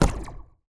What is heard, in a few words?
STUN